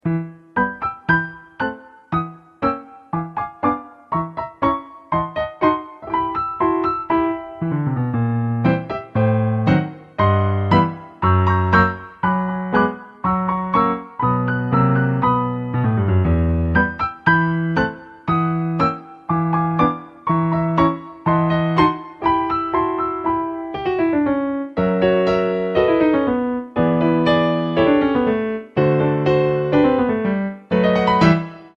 piano; Dance of the sugar plum fairy

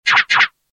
scratch sound

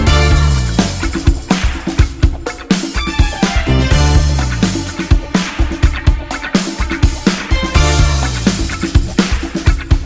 funky disco ringtone

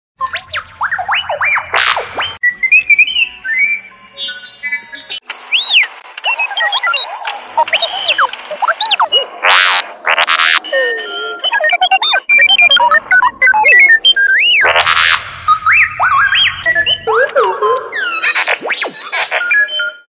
R2D2 ringtone

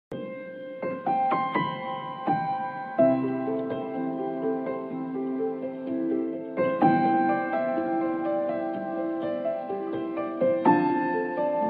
piano ringtone

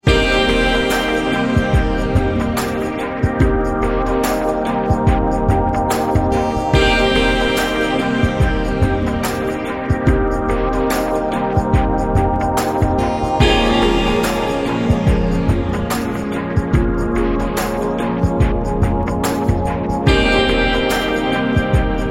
lounge music

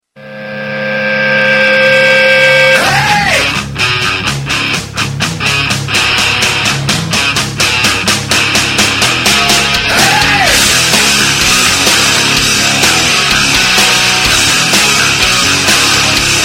ringtone

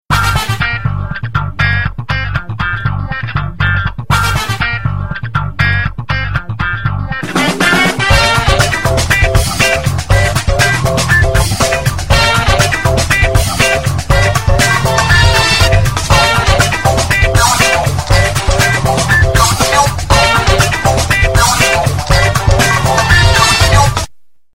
funk music ringtone

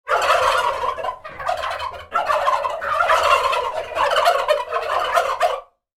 turkey ringtone

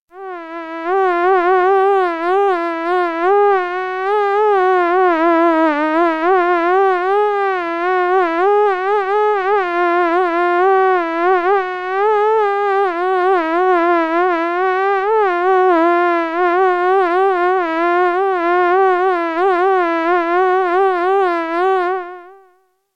mosquito sound

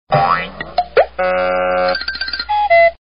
cartoon sms ringtone

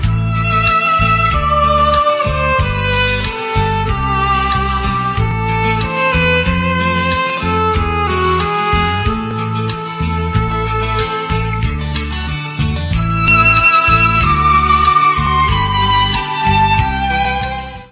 love violin ringtone

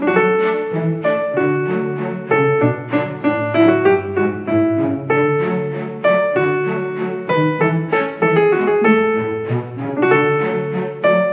one handed piano ringtone

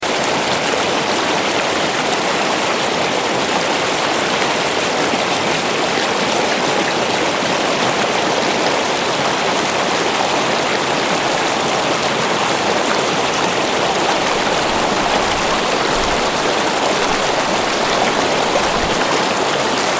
running water sound

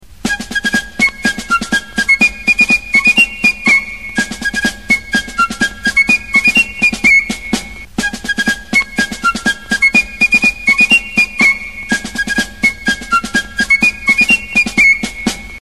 Flute ringtone

